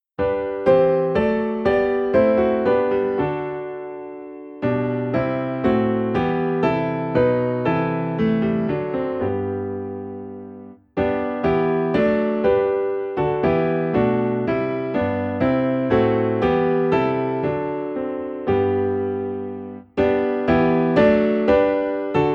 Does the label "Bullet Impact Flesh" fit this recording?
No